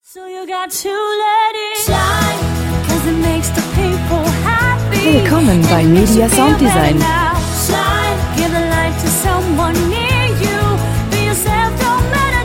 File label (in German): gesang